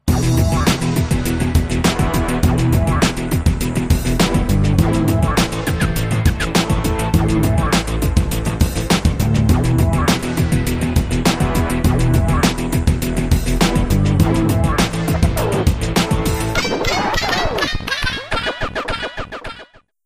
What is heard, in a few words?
Menacing Power